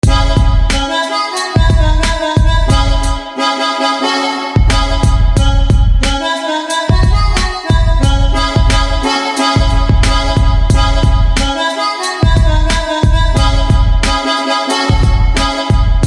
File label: Bronze Harmonica